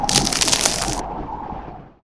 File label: ice wall slow; weapons; dota; invoker